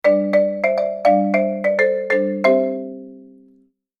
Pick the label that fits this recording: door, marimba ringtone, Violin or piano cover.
marimba ringtone